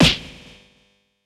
Smash Hit; Super Smash Bros

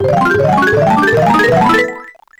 Magic Grow; Kamek; New Super Mario Bros Wii

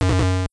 door appears; super mario bros 2 nes

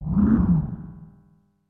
Ambience; Super Smash Bros

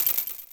Chain Swinging

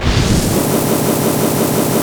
Large Spike Pillar

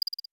Off-Screen Indicator; Super Smash Bros

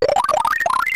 grow; Mario; Super Mario Paint

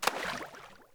Splash Fire Ball Poison Water

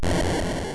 bomb

